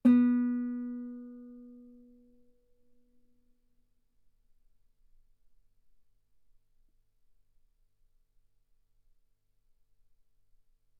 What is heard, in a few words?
Guitar ff sul B B3